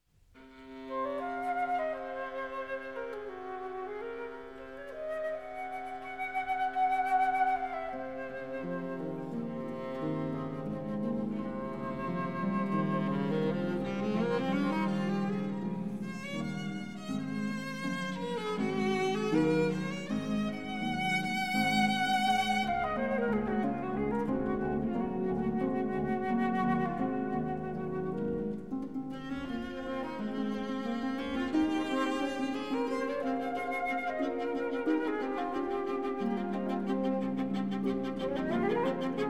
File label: flute, viola and harp; Sonata No. 2 (Debussy); Legend of Galactic Heroes; Galactic Empire SIDE